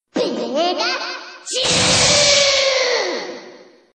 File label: Thunderbolt Attack